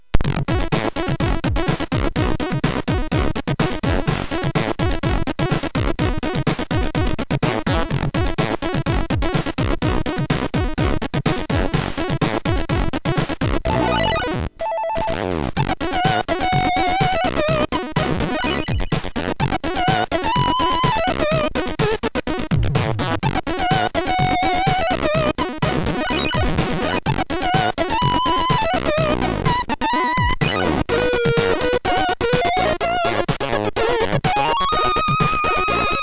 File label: Score, MOS6581; MOS6581R3